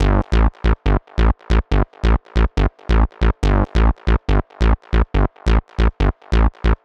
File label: 140 House Bass Amin; Dance DJ; Digidesign; Pro Tools; Pro Tools Essential Loops